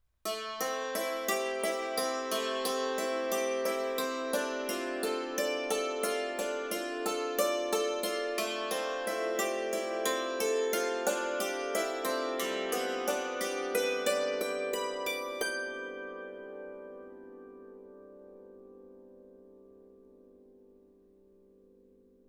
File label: dulcimer